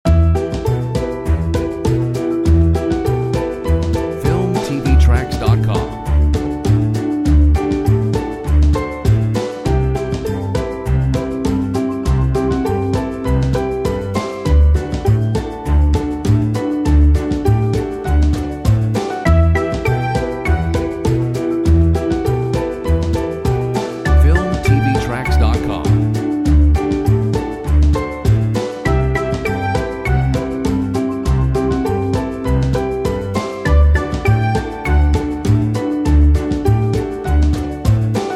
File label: Quirky ballad